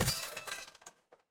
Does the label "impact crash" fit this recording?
Yes